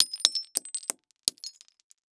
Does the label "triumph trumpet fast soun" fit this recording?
No